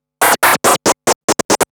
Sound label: Scratch Fills 140BPM